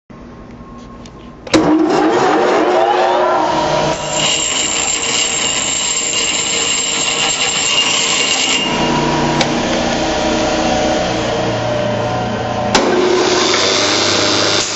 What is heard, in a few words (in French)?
Meuleuses fixes